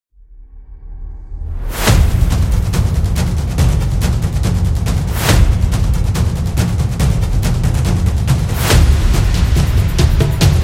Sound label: Taiko; battle drums